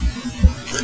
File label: PERC